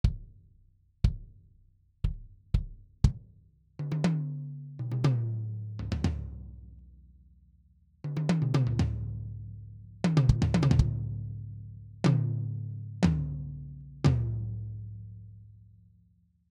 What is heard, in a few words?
Kit Canopus